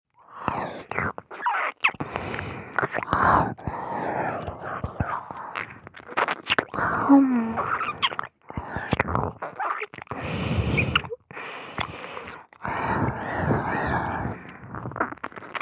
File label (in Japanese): 耳舐め（激しめ）